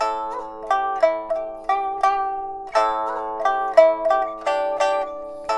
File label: PIPA, 90; INIS - Opium